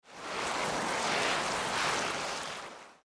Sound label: sound swimming; hoyoverse